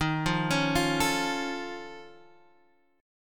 F Augmented 7th D sharp, chord

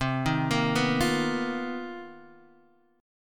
B Major 7th Flat 5th C, chord shape; BM7b5/C; arpeggiated; JGuitar

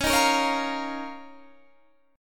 Eb 7th Suspended 2nd Db

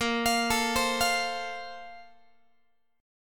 Bb Major 7th Suspended 2nd, Guitar; arpeggiated

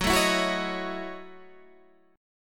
F sharp Minor 13th, Guitar, Standard; strummed; JGuitar

